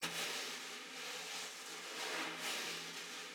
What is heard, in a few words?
Vintage Snare Drum, 5.5X14; Q Up Arts; Johnny.C's Vintage Snare Drum